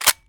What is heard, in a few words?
mp7 bolt; Escape from Tarkov